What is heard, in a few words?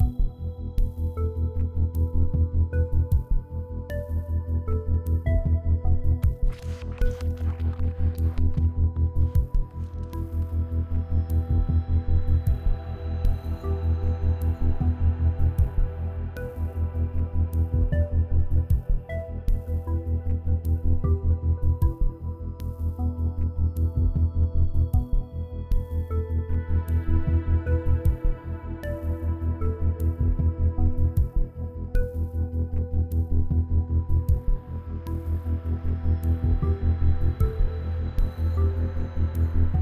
Gridnodes Anchor 8CH 77BPM